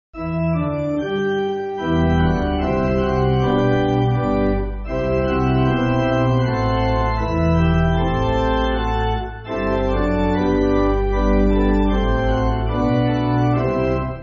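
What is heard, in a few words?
Pipe, Music Backing Files for Churches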